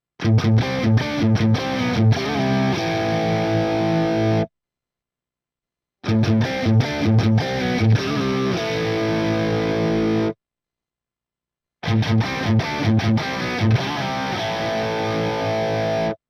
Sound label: Crunch Split coil Humbucker; guitars